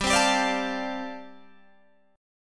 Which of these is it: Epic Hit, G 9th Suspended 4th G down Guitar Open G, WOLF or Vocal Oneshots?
G 9th Suspended 4th G down Guitar Open G